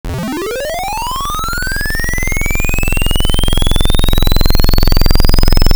Radiowave, Wavetables; Cymatics; Wavetables Collection Vol 3; Serum

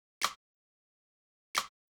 Woodblock, Hip Hop Exotica, 090 Emin

